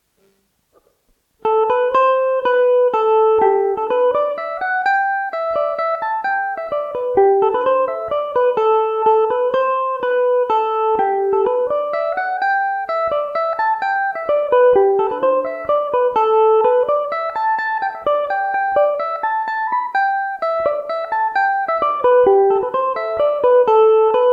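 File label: A dorian revelation, Irish mandolin; ballydesmond no 2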